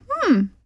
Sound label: Emote Female Confused